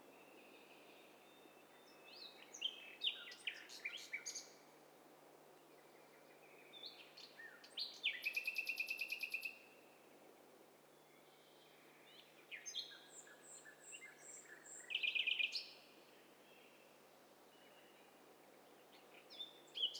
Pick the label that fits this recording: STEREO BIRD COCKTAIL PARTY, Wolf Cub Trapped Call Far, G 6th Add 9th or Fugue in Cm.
STEREO BIRD COCKTAIL PARTY